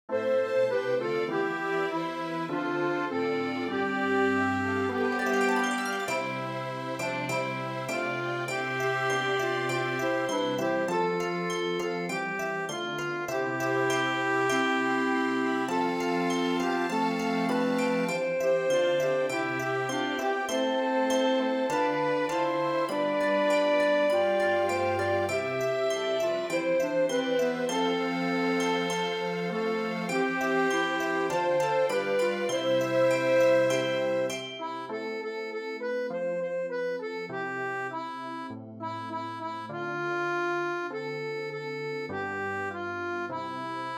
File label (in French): ORCHESTRE